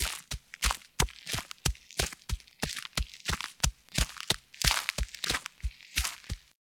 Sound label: Horse Steps Trot Loop Dirt; Warframe; Duviri Horse; Vehicles